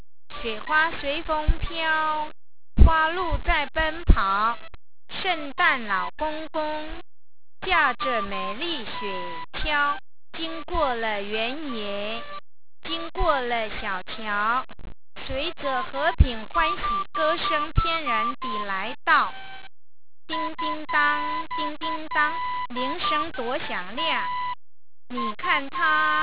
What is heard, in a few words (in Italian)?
jingle